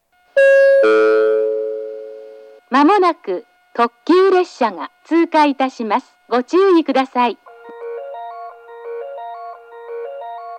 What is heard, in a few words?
limited express pass; jr-east; minami-matsumoto